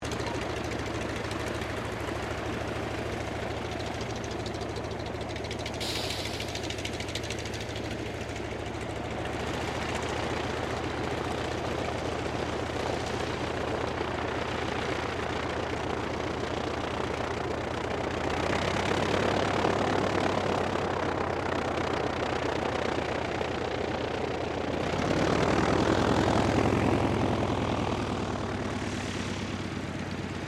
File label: AIRCRAFT CAC WIRRAWAY TAXI STEREO; CA-16 Wirraway; Airplanes; Vehicles